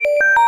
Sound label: KEY Hold Loop